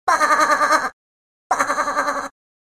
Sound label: Sheep Bleats; animals barnyard